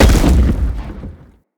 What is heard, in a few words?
Blunt Heavy Impact Wood; Weapon Impacts; Player; Environment